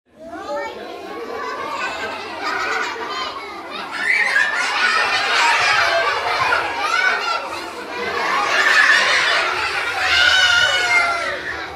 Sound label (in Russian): mnogo detej vozrast 3 6 let v bolshom pomeshhenii